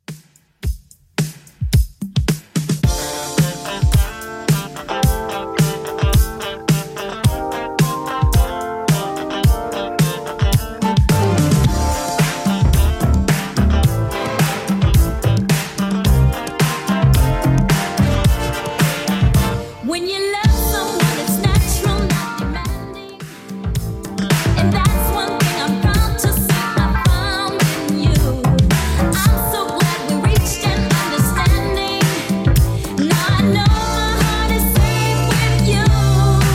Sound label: 80s Redrum 109bpm